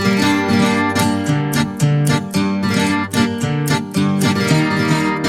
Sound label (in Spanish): GUITARRAS